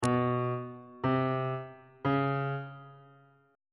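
f diminished 5th perfect 5th augmented 5th intervals on bass clef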